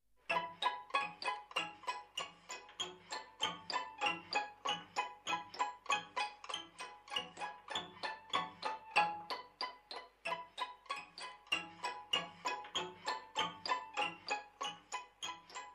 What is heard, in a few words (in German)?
spieldose